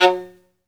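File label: SOLO VIOLIN, Synclavier Strings; ILIO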